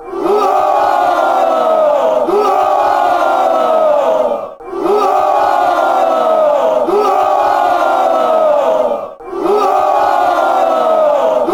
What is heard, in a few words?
uaaaa, fan chants